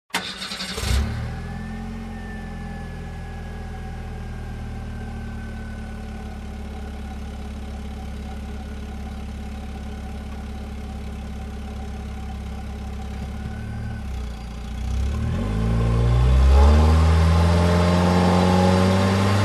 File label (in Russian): Автомобиль заводится быстро едет глушится The car starts quickly rides Stop the engine